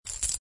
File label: get Coin